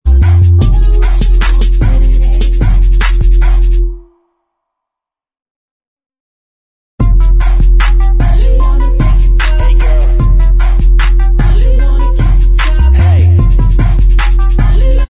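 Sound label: backing vocals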